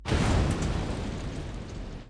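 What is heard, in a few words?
ground object explosion; PC Gamer IT CD 47; USAF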